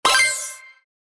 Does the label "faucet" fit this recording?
Yes